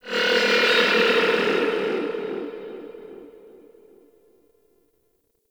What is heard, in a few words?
Pursuit Space Ship Call; Warframe; Grineer; Vehicles